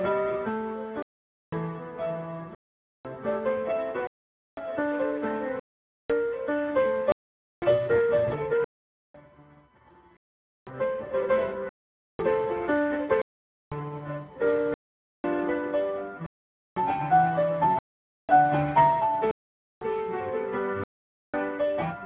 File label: piano; wavegan